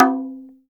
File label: GENDANG C4 f, DRUM; Spectrasonics; Heart of Asia